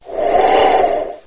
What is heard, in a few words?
wind